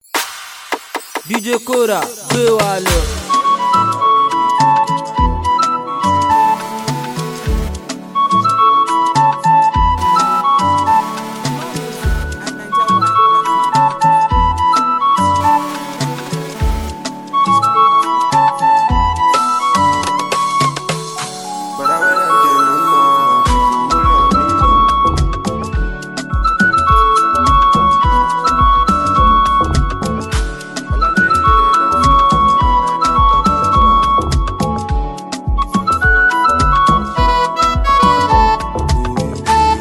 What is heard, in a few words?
Flute; RIP Mohbad